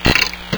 bump; needleman

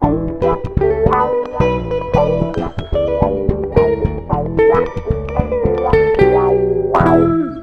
funky

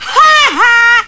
haha, voice; lmctf

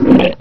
gurp; ogre

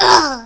fall; openffa; astro

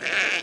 baa; baseq2; aggrocult

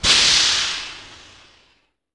steam

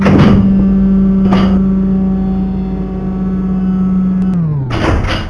crane; rogue

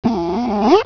pain

